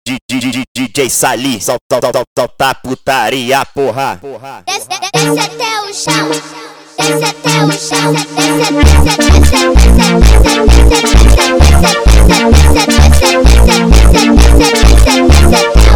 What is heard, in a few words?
Phonk MONTAGEM